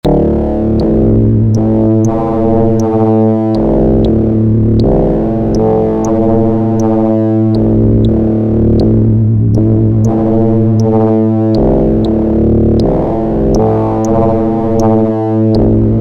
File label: synth foldspace